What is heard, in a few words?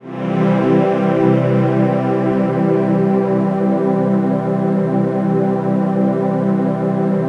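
chord pad, Pads; Best Service; XX Large - Pads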